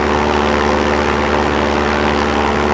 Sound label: helico; svencoop; sa13